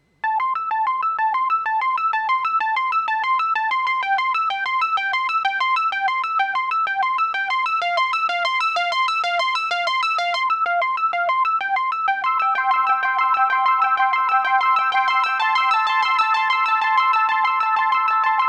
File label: JU-06A Delay